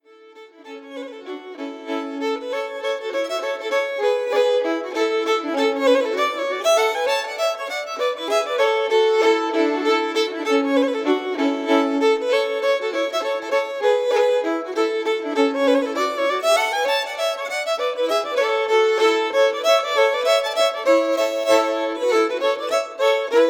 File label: Rants